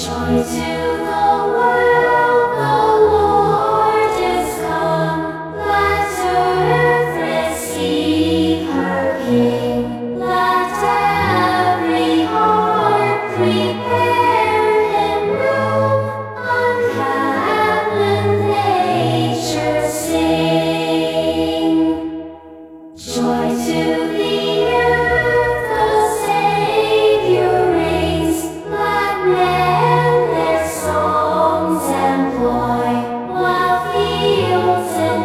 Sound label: choir